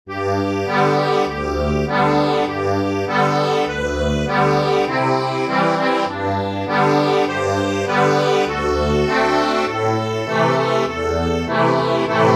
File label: accordion, sheet music